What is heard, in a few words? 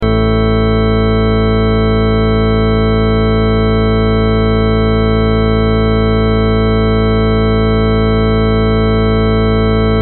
artificial harmonics test